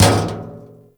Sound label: Physics Barrel Impact Medium, Metal Barrel; Warframe; Physics Objects